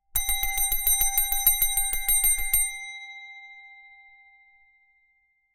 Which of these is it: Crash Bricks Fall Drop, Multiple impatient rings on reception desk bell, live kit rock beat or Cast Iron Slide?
Multiple impatient rings on reception desk bell